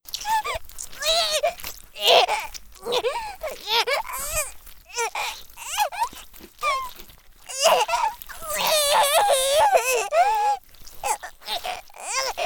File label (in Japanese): 激痛; 効果音あり